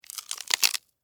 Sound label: Insect Eat Chew, Bite, Crunch, Bug; Animal; Insect Eating; BlastWave